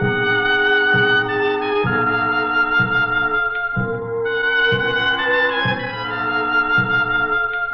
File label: sax, Sad